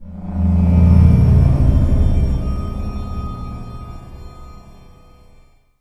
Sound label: a glimpse of death, music; Left 4 Dead 2; Source Engine; video games